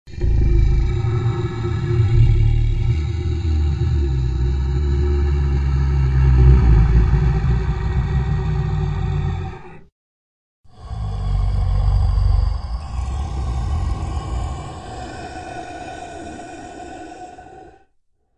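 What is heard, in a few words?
monster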